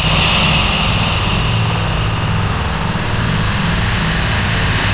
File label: air generator; hl2